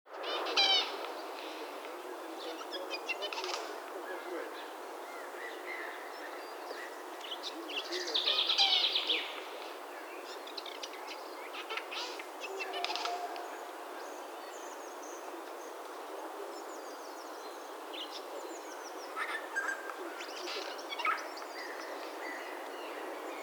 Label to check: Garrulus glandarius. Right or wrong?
Right